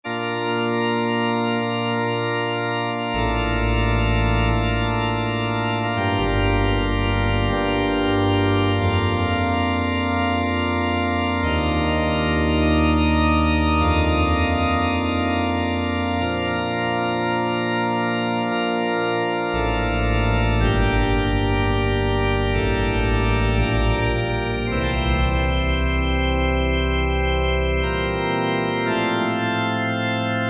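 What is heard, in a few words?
Church Organ, Korg MSC-1S; ChurchOrgn; Korg MSC-1S Variety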